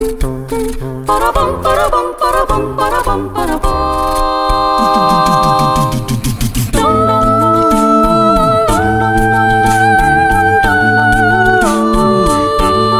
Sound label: acapella; sherlock ost